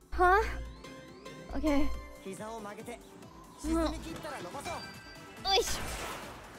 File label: noises